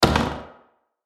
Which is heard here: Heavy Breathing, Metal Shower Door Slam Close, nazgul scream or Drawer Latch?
Metal Shower Door Slam Close